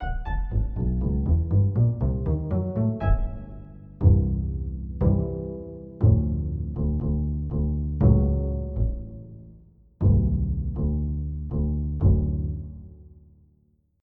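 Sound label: Pizzicato 8ve, bass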